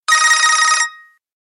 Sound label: Standard Tone